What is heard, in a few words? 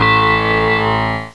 deep bell, percussion instruments; Sound Effects for Multimedia - Volume 1